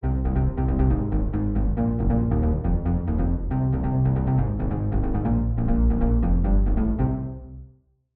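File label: synth bass wet, ensemble reverb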